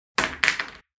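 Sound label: falling sound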